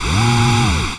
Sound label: vacuum